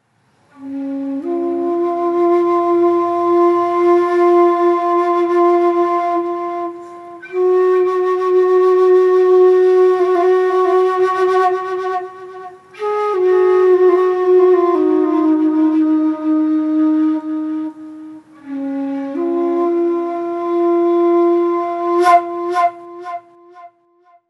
Flute

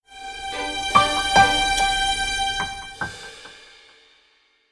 Crystal Sound Logo

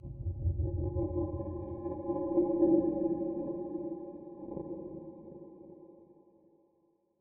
Sfx Tunnel Reverb

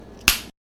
Breaking stick

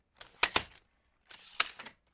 Suitcase Handle

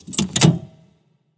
Closing Metal Door

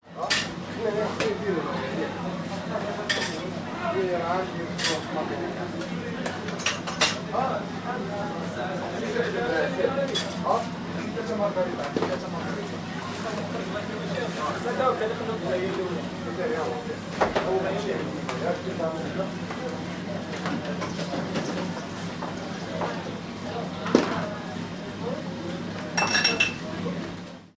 Morocco Kitchen Ambiance

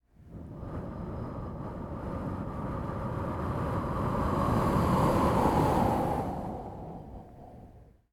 Wind

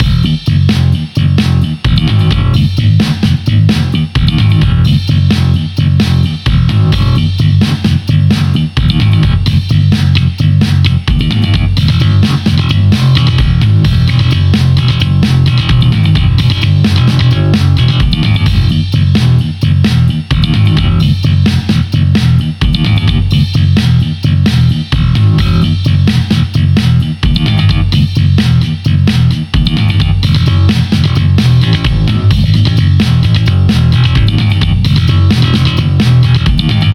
Bass Loop And Drums 130 Bpm

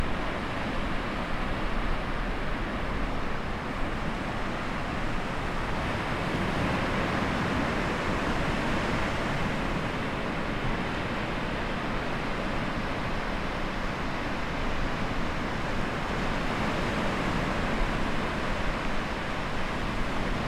At The Beach

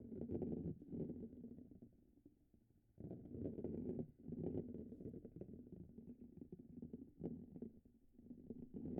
Golf Ball Rolling

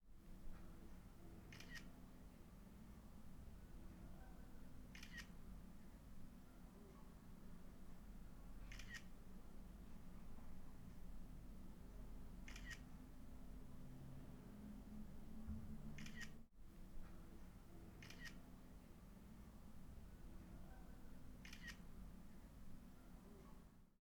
Iphone Camera

